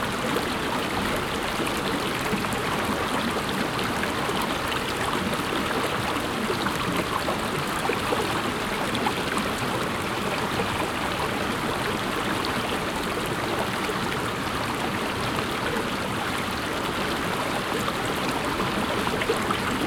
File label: River Binaural, Mountain Streams & Rivers; Soundbox Library